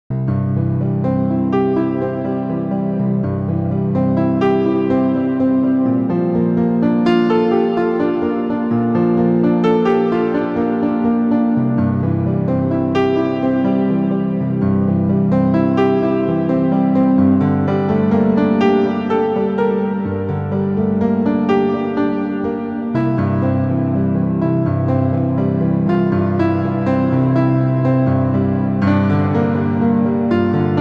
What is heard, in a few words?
piano waves; covid tracks